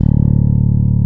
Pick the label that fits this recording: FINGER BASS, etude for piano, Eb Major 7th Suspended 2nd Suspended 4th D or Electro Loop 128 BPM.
FINGER BASS